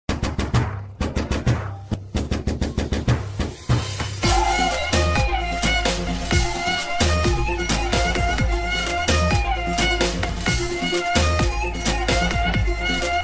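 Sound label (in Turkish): roman havası; bayan romantik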